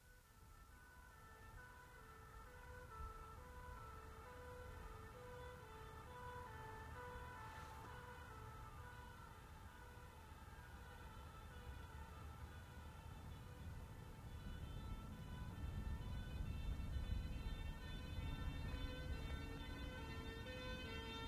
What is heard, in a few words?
Fire engine, long approach and pass right to left with two horns; Emergency Vehicle; BBC